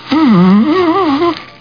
NOSE BLOW

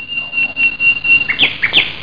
Tropical Birds

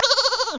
baa; worms dc; tykes

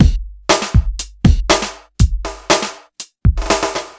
Electronic Drum, Loops

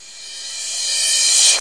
rev crash

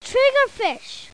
TRIGGER FISH SOUND

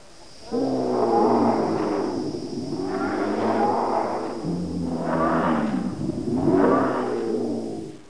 Iguanodon herd; Walking With Dinosaurs; BBC

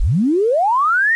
cargo load

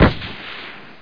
START GUN; DECATHALON

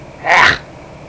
ack; Sound Library 2000; Wayzata Technology; People

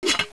puts on metal cap; DR. BRAIN

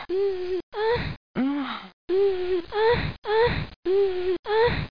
going; Sexy Games 3

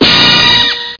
hits

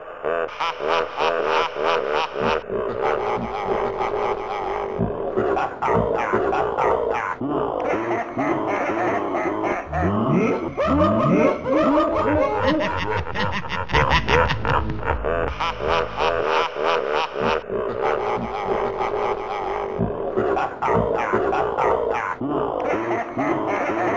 laugh

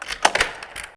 unlocked; Thief

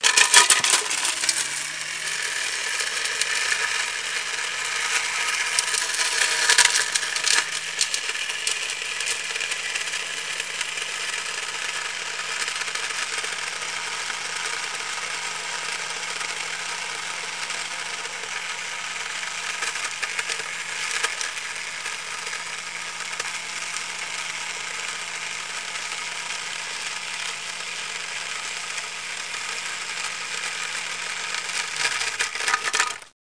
blender

